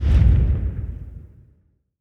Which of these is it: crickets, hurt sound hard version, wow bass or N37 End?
N37 End